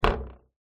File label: impact metal table leg vibrate; zapsplat